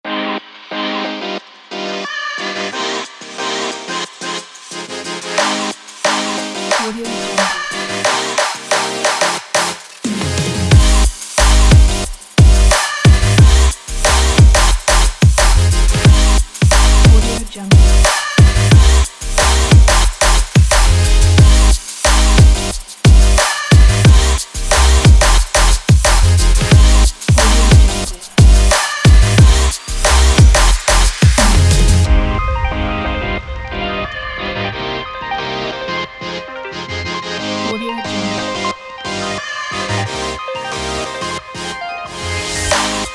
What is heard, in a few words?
future bass